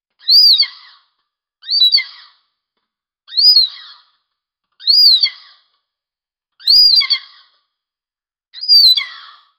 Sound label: tiny lion sound